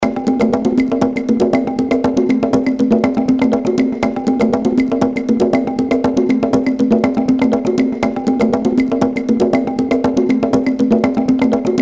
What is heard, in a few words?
World Drums; Apple GarageBand 2; Apple Loops